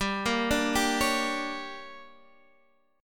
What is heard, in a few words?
D Major 7th Suspended 4th Sharp 5th, chord